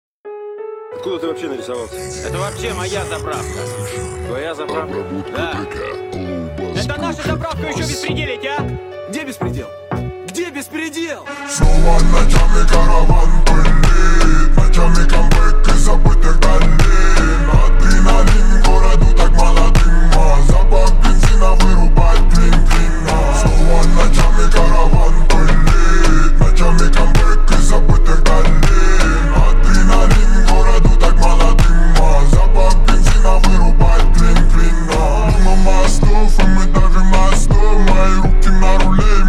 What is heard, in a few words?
25 28 Hz Screwed Low Bass